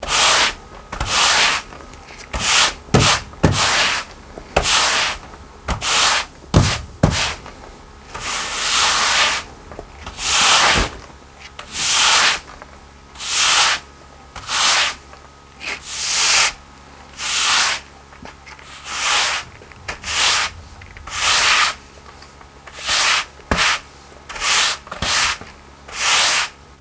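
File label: sweep, cleaning, houshold; WAV Sound Effects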